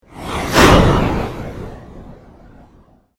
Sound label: PULSE SHORT SWEEP; Sound effects created by manipulating other sounds; Sweepers; Sound Design